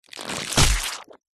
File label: horror gore impact thud bone break squelch